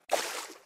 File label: Splash